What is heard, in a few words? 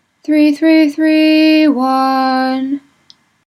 weekday minor closing, nusach; SCALE AND MOTIFS FOR WEEKDAY MINOR